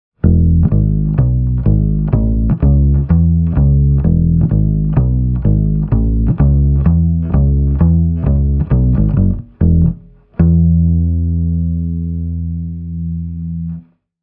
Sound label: toob 12b gk mb200 double bass, Double bass with Shadow pickup; Lightweight bass speaker cabinet